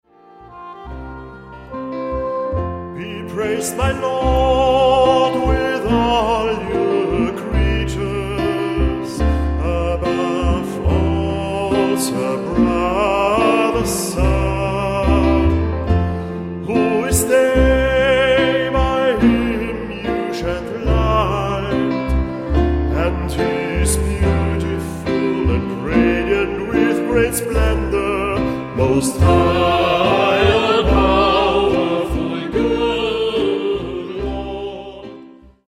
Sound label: canticle